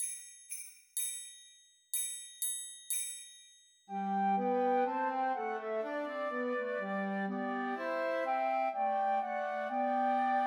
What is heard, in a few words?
Christmas Trios for Two Flutes and Clarinet; Ding Dong Merily on High